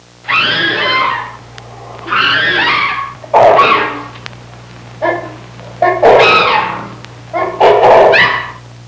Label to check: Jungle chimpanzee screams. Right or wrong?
Right